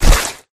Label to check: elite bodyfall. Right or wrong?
Right